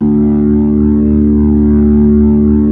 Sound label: B-3 C305 38 R, Keyboards of The 60's and 70's; Full Xfade